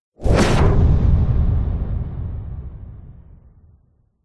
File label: explosion short whoosh into a big heavy bang